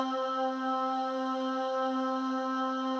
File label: Melody C Body 1 Octave; Melody Hermit